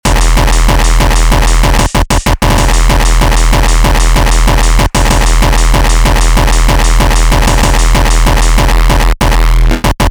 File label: New HardCore; drum; beat; Drum Blaster: The Ultimate Beat Generator, Sampler Plugin for Modern Producers